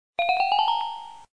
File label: correct; Memory games; Subtraction of fractions